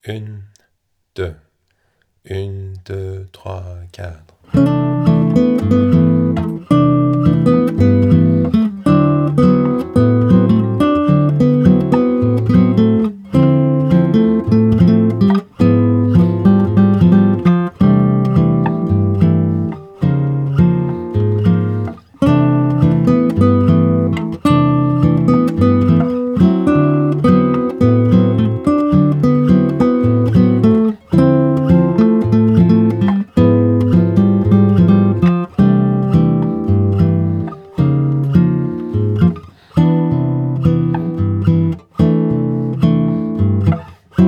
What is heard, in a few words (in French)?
melodie simple bossa nova, guitare